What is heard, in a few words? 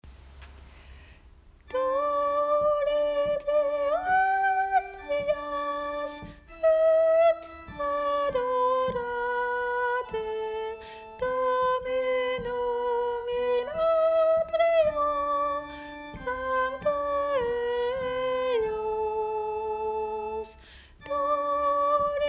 tollite soprano; Montacanto